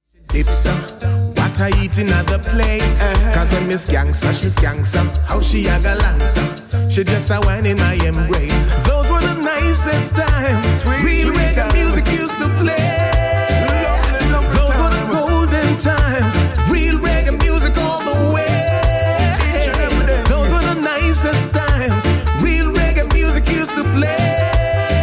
reggae music

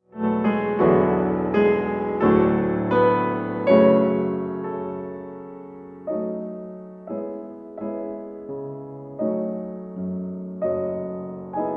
pour le piano sarabande; claude debussy

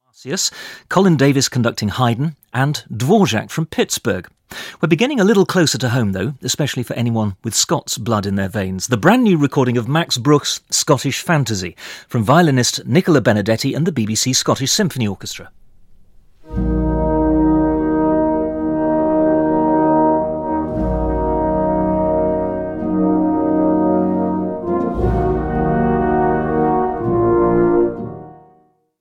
fantasy for violin and orchestra; max bruch; scottish fantasy; op 46

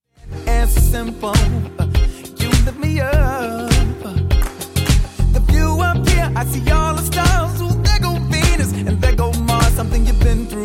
orchestral

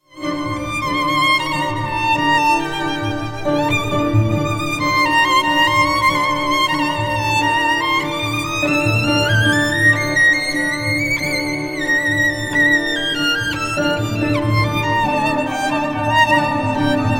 violin concerto; aram khachaturian; 3rd movement

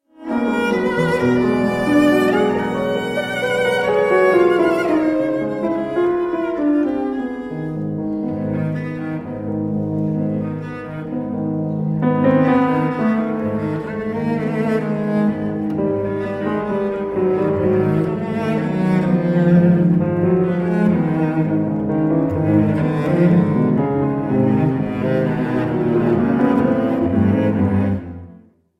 sonata for cello and piano left hand les adieux

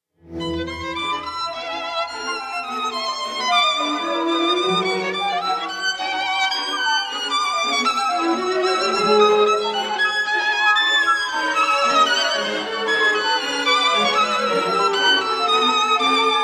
violin concerto in a minor op 82; glazunov

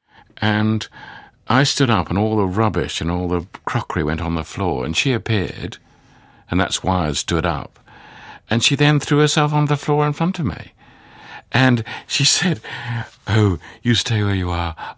piano mazurka; Martinu

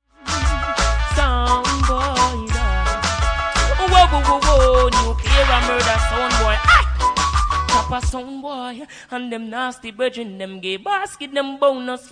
reggae music dubplate

